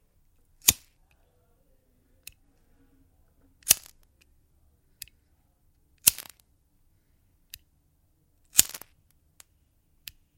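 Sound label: lighter switching on and off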